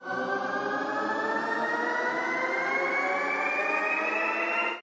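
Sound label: respin sound; longpao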